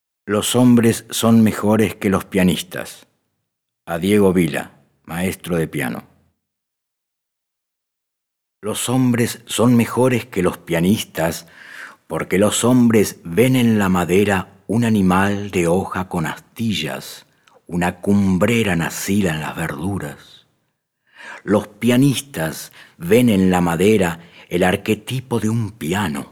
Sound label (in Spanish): Pianoforte